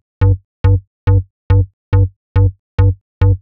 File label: Synth Bass; Basses